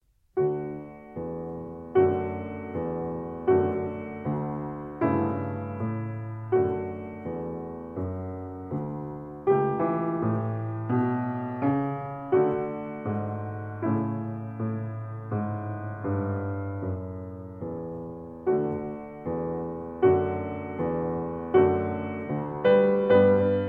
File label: Largo Appassionato From Piano Sonata Op2 No2; Beethoven